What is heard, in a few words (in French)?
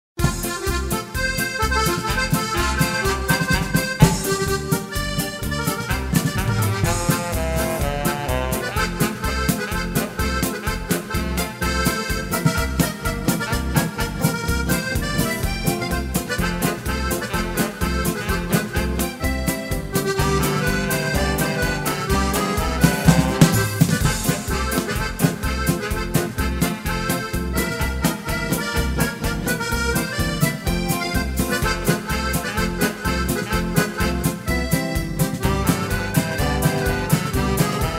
Marche des Accordeonistes Lyonnais